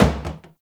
Plastic Hit Short; Ambience; Gameplay; Warframe; Nineteen Ninety Nine

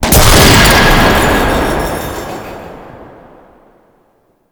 Power Cell Explosion; Warframe

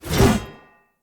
Great Sword Whoosh; Weapons; Swords; Mendicant Great Sword

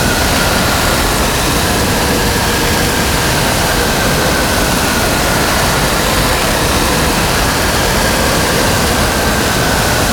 Tank Spray Loop; Enemies; Weapons; Effervon; Warframe; Lasrian; LasrianTank

